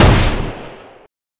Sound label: Shot Gun; FREE SFX PACK VOL 2